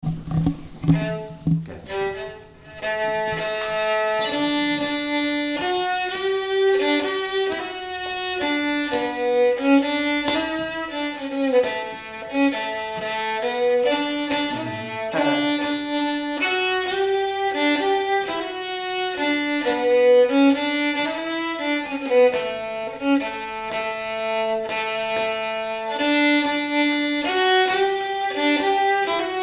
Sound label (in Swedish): Ingers brudvals stämma